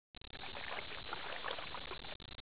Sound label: water